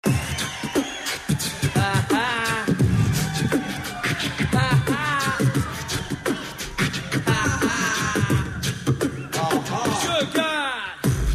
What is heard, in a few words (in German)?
beatbox